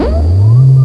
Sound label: Laser charge; Barrack Unity